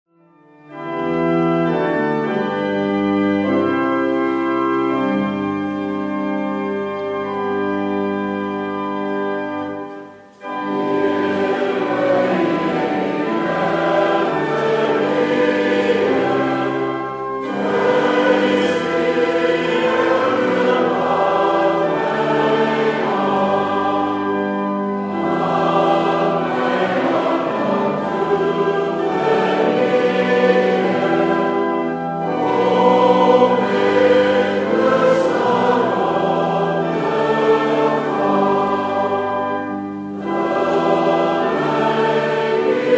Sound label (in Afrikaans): Gemeentesang; Psalm 25-1 vers 2